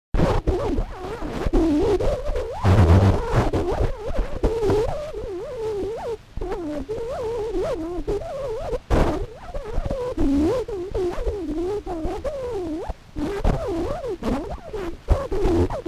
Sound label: declicked